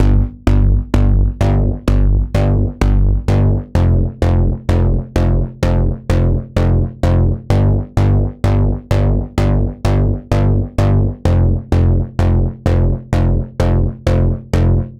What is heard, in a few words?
Poly Bass; super crush k.o.